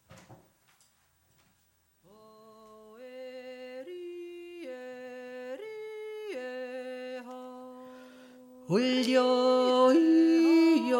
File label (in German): f ranond zweite stimme